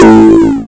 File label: tom